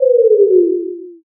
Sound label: debuff